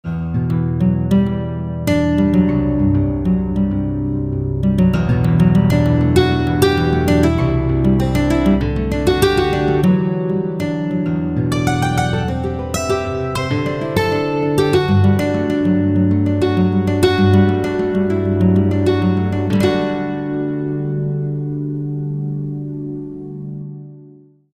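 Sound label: Layering Acoustic Guitars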